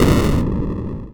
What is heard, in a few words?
Retro Video Game Explode